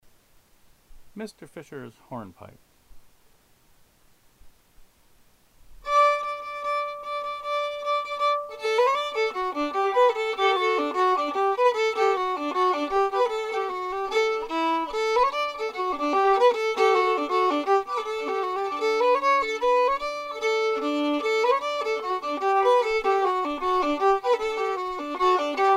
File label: Hornpipe fiddle; (Mr.) Fischar's